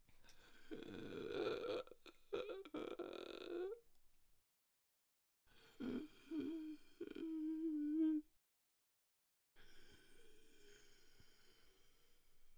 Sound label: Human Suffocating Breaths Male